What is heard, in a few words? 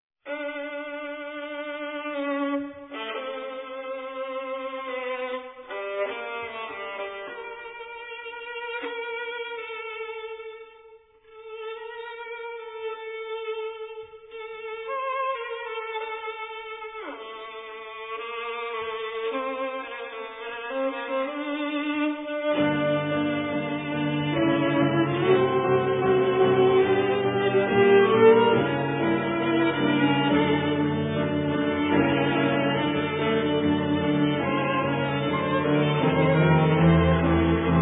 Piano trio in G minor; SMETANA SUK NOVAK; Bedrich Smetana; op 15